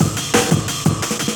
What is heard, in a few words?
Firefight, breakz